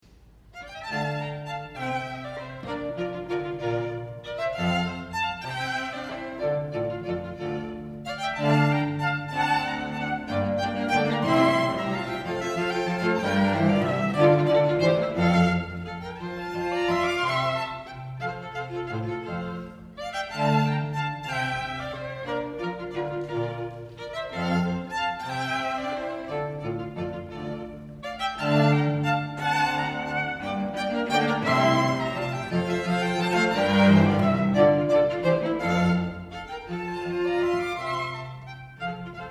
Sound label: Minuet Trio